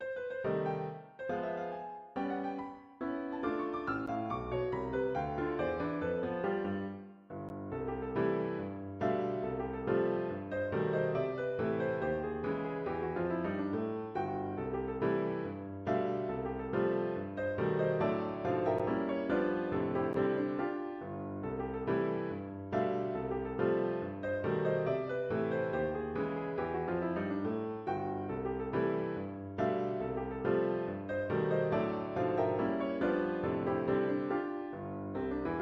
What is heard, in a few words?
horsefly rag